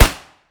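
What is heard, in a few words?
weapon ar suppressed fire player atmo interior; gmod; viper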